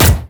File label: basic attack; rumble